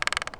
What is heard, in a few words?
Tree Creak